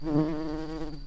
bumblebees, Pascuorum queens

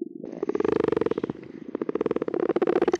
Common or Grass Frog

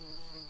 bumblebees, Lucorum queens

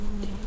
Lapidarius queens, bumblebees

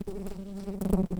Pascuorum workers, bumblebees

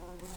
Lapidarius workers, bumblebees

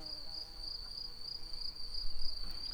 bumblebees, Pascuorum queens

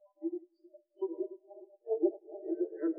Moor Frog

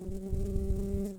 Humilis workers, bumblebees

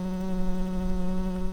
bumblebees, Hypnorum workers

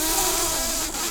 bumblebees, Pascuorum queens

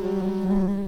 bumblebees, Hypnorum workers

